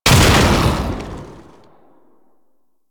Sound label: Molt Explosion; Warframe; Saryn